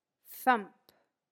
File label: thump